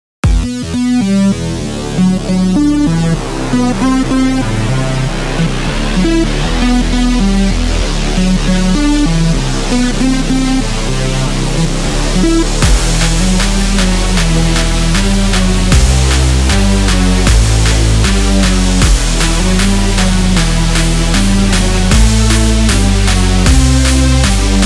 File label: Kit Emin 155 Mix; GhostHack